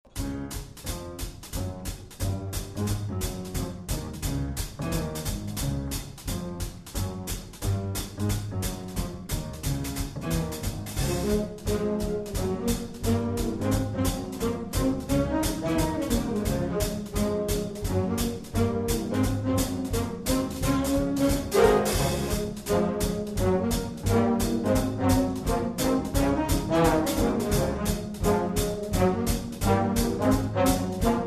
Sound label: Swing Orchestra